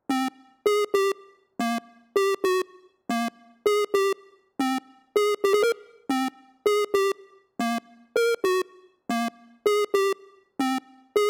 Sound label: Melody; chippy